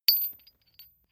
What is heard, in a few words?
medium metal impact medium rolling